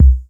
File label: good kick C key, bass drum tuned to the C note. Loudest frequency: 78Hz